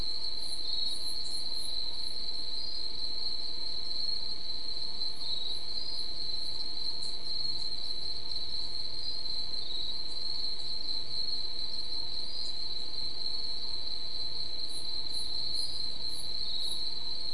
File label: mainly insect cluster